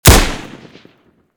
SVU fire; STALKER

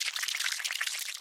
mtushkano eat; STALKER; customnpcs

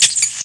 rat death; STALKER; customnpcs

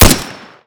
pipe berdan fire; STALKER

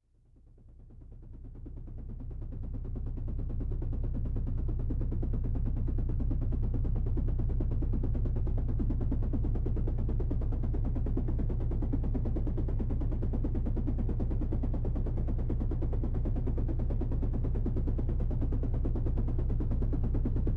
ambient helicopter; STALKER

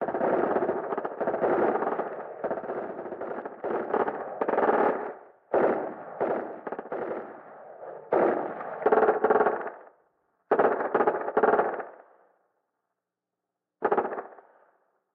ambient shooting; STALKER

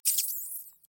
mrat take hit; STALKER; customnpcs

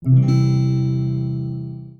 c accord, guitar; STALKER; customnpcs

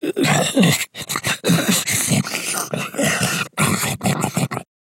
snork idle; mutants; STALKER; customnpcs

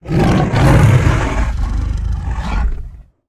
mchimera death; STALKER; customnpcs

